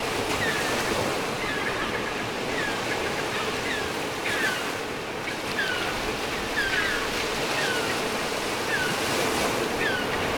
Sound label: Sea Wind